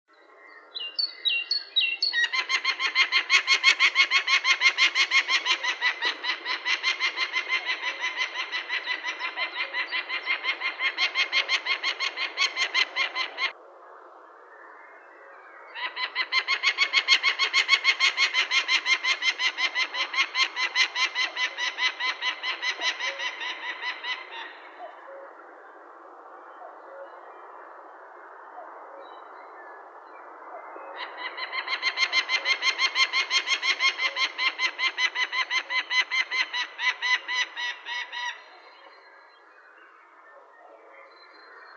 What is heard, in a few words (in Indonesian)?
suara alap kawah